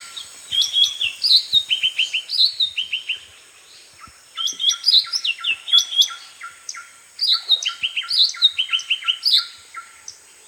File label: cucak ranti blue winged leafbird chloropsis cochinchinensis